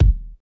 00s hot reggae kick drum sound d sharp key, kickdrum sound tuned to the D# note